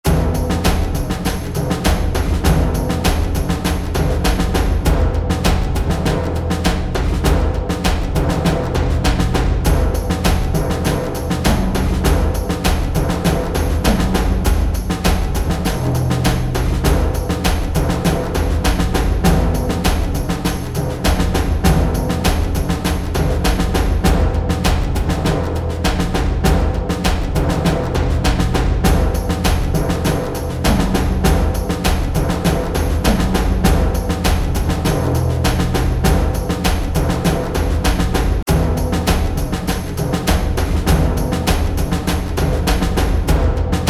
Train Percussion Mix; Shank

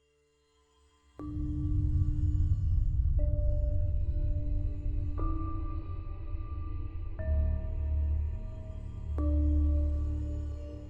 Sfx Abandoned Building; Ghostwire Tokyo Prelude

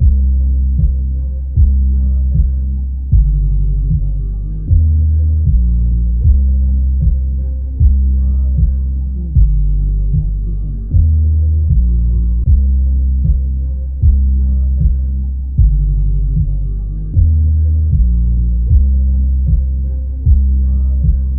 Random Truck Music

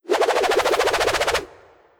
Abyssalflame Whirl

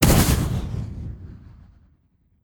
Blazing Imp

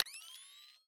Mounted Camera Timer Start

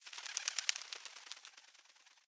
Pigeon Fly Away

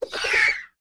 harpie attack; enemies

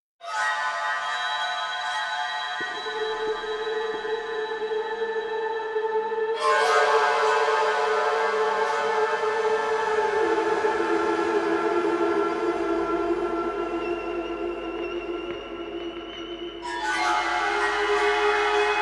Castor Woods Ambience; Dying Light The Beast

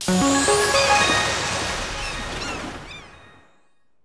Jingle Next Track; maimai dx splash plus arcade gamerip 2021

